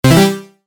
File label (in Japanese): 決定 モノラル